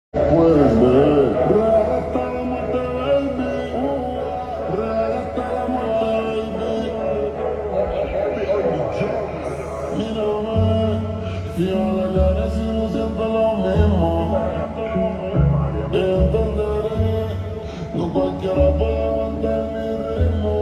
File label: Remix Ringtone Slowed Reverb